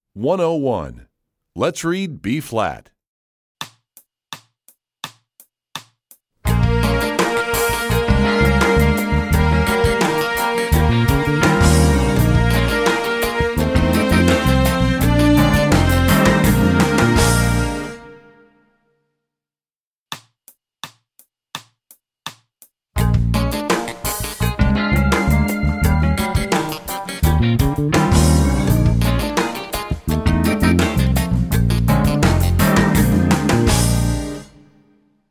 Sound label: Lets Read B flat, strings; EE2000 Book2